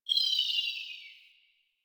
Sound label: Super Weapon Bomber Bomb Drop; Warframe; Caliber Chicks